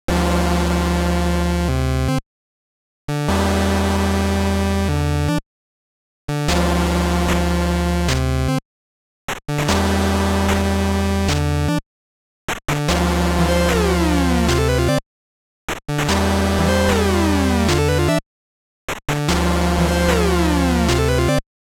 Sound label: weird